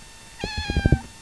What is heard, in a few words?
Meow, ginger tom cat; he is a very trusting cat; He also likes to meow early in the morning; Marmaduke; rescued by the RSPCA